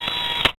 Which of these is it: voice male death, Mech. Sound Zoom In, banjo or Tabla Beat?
Mech. Sound Zoom In